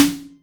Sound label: Snare, Roland MC09